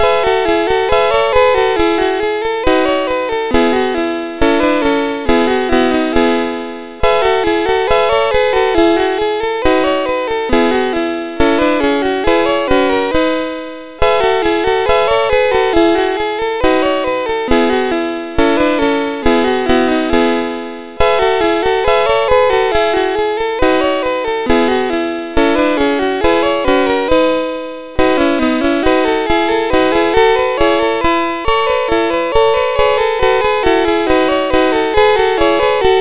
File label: Marsch, sid MOS6581R4; Music Shop